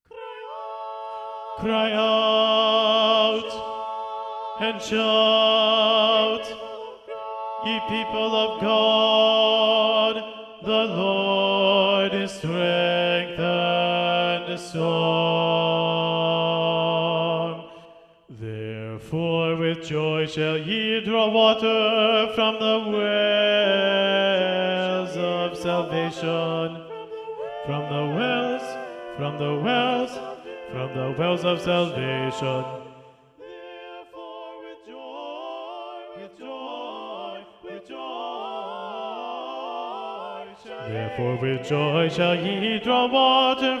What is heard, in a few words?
bass predominant; knut nystedt